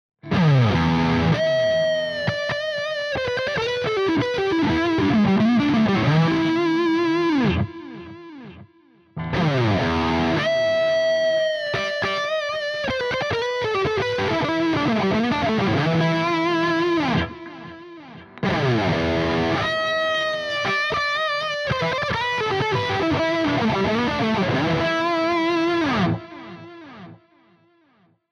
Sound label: Cort MBM 2H high gain All positions Lead